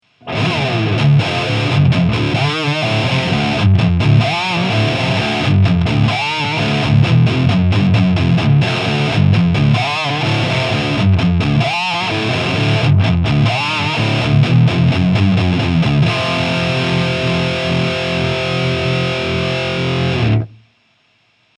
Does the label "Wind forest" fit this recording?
No